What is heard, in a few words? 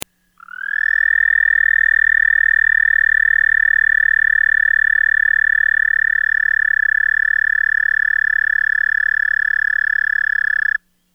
american toad